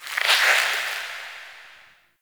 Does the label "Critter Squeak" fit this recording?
Yes